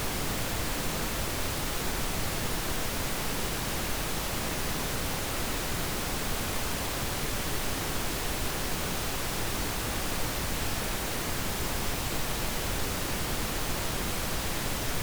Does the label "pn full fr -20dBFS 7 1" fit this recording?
Yes